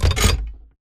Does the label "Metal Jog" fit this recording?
Yes